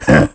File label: Taunt Sword Grunt; Ganondorf